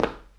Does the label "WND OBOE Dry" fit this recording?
No